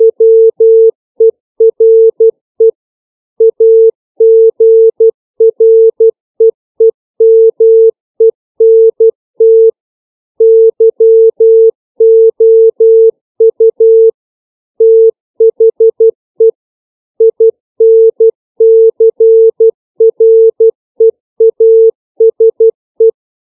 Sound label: five common words, CW